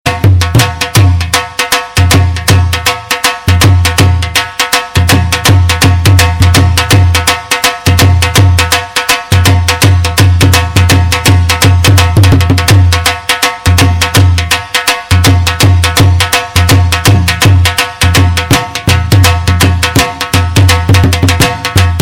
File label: dhol